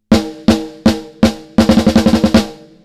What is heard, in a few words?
Heavy Rock Snare; Joe Chiccarelli; snare preset